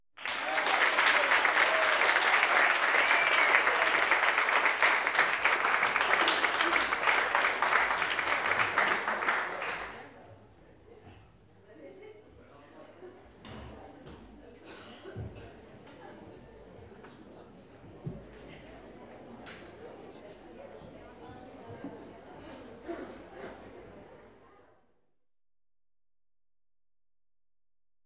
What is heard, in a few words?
Applause + Buzz Of Audience; KMP Film Video Sound Effects